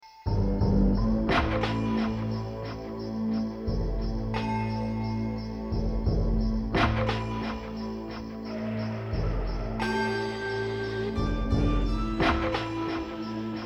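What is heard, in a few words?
mellotron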